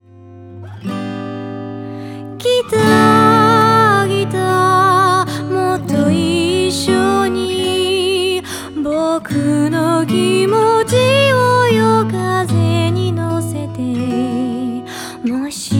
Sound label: guitar sample